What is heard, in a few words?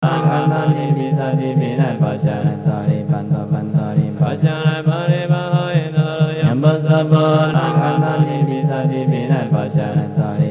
shurangama mantra